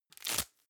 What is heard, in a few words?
velcro open